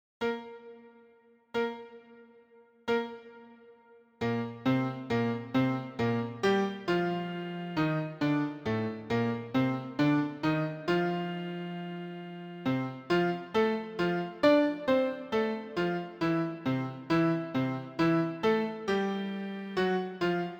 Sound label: Study trom slow; Blazhevich